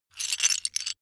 metal pieces clatter short

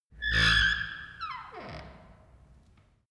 wood door old creak low

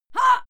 female fight scream short; schreie; menschen

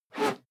whoosh whistle slow steady

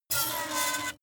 metal screech phaser fast

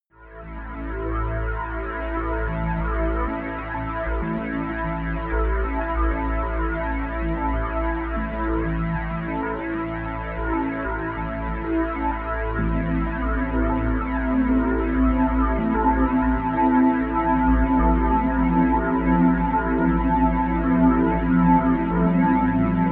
drone melancholy

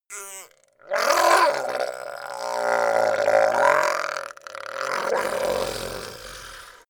goblin groan painful long; schreie

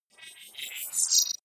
wobble swell robot; sweeps-swells

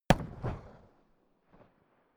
rifle ak-47 exterior fire single distant; comedy cartoon; pistolen gewehre; Pistols and guns

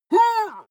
male fight moan; soundpool

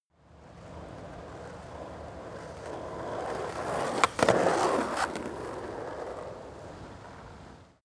skateboard single drive by bump; sport freizeit

